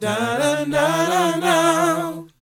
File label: BOYZ, Vocal Planet, R&B & Dance; ILIO